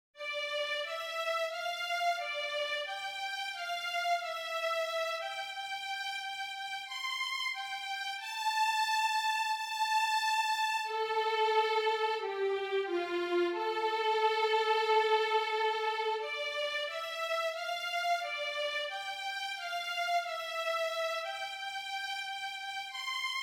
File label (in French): VIOLON; AGNUS st Jean